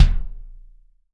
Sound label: BD, DR BD; Best Service Gigapack 2